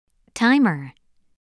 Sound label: word timer